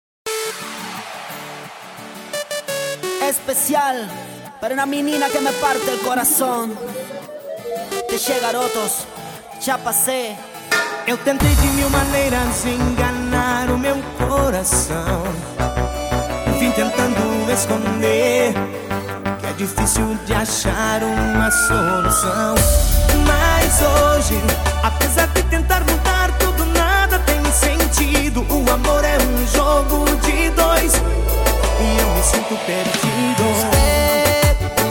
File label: reggaeton mix; reggaeton mix evolution